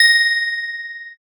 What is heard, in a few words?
handbell